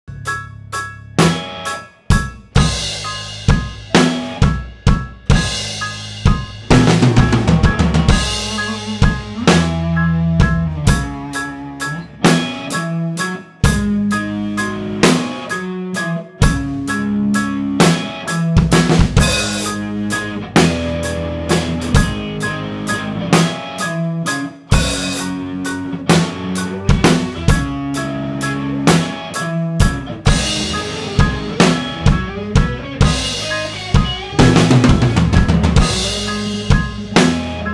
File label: instrumental